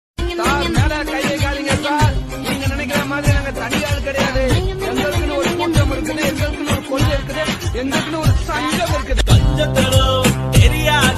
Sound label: friends, Tamil bgm